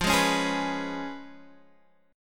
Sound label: C sharp 7th F, chord